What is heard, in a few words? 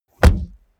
car bmw e46 exterior door rear close slam; Cars